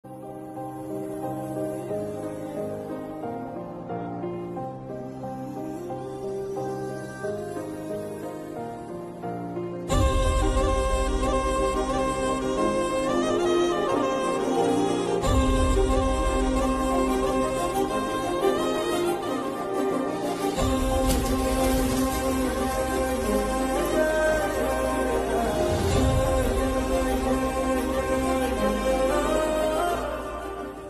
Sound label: Instrumental Music; Ringtone